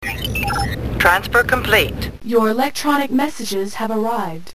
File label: electronic message